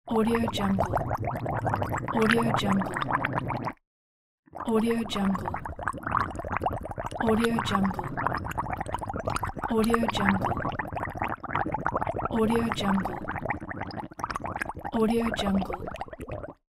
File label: bubbling boiling water or gurgling water